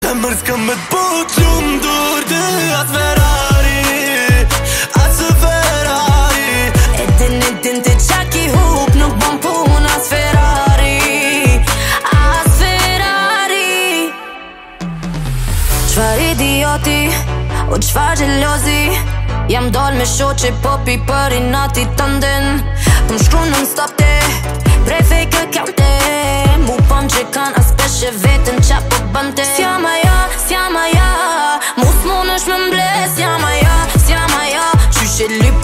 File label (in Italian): FERRARI suoneria